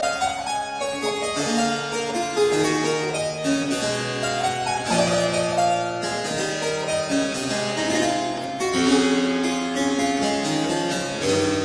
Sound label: Suite in D major Prelude; Locke; Melothesia; Arthur Haas